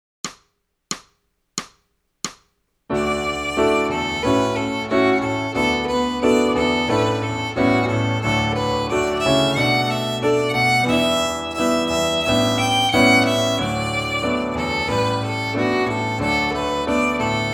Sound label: Merry Blacksmith Slow